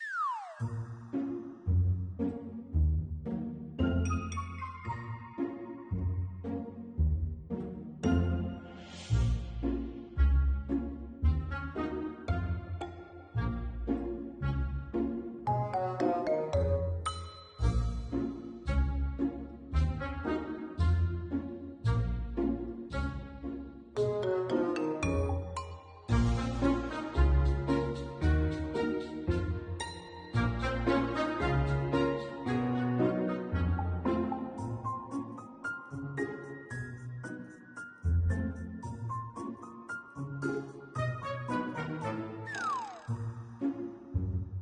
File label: penguin bg music; CodenamePenguin